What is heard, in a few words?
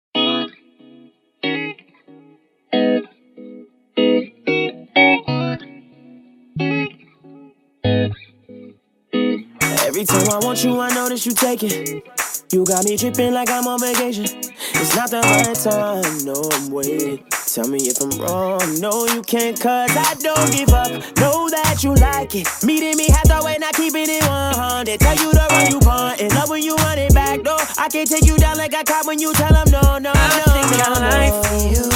Remix Zouk Music